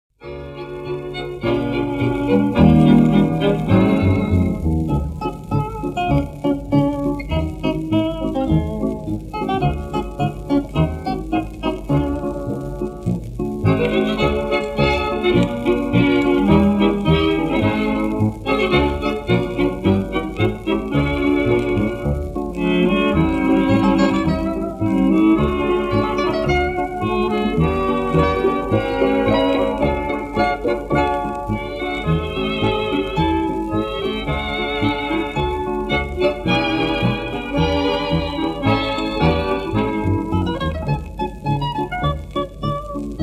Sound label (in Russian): instrumentalnyiy ansambl